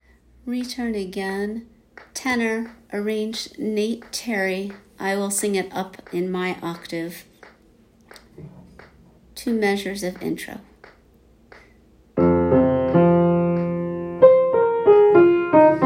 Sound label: Tenor; High Holidays